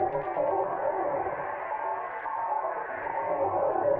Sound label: Tonal Re Draw, sparse soundscape